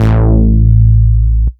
Bass Root A

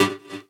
Synth Shot; Vengeance Samples

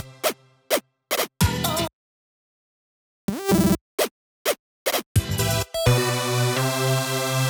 128BPM Synths; Vengeance Samples; Knight Kit

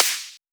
Claps

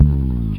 Slides; FX; Vengeance Samples

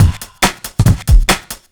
Breakbeat; Vengeance Samples; VEC2 Loops

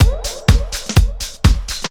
drum loop 125, vocal house

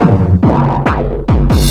Loops Fills; Vengeance Samples

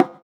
Bongo; Vengeance Samples; VEH1 Percussion

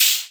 Open Hihats, Cymbals; Vengeance Samples

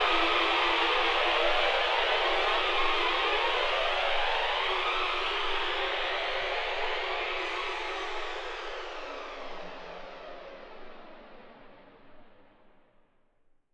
Downlifter

